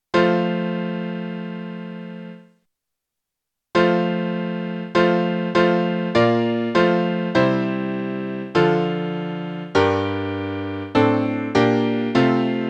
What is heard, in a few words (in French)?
Dans une etable obscure piano